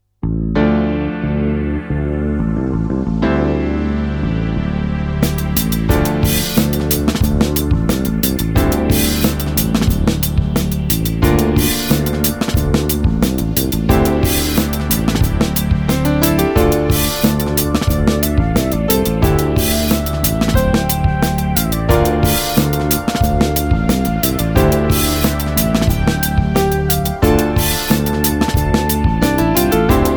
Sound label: open string groove backing music, double bass